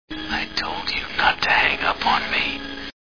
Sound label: scream